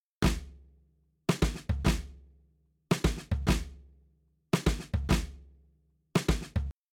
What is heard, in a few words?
Drums, shuffle drums; WDR2